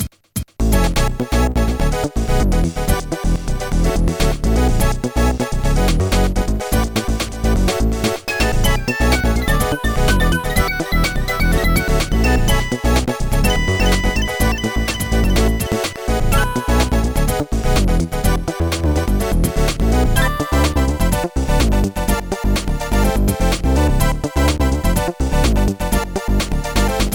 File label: school sax; Trackers; Modland; Racoon